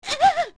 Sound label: Comb Backfire; OOT